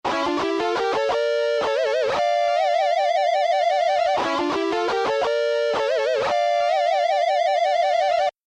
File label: Harmony